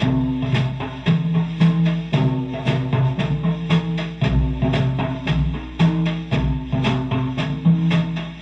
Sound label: Loop, rhythmate, classic machines